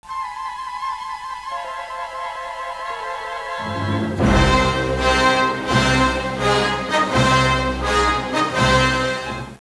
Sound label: theme; rat